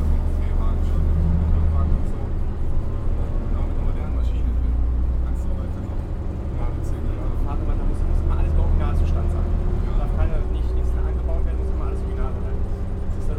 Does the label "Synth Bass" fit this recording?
No